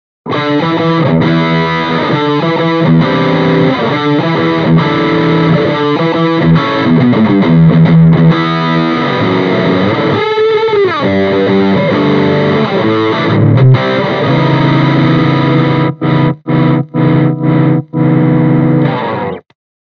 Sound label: Mic Inside Distortion Les Paul; Mini Rox; THE GOVERNOR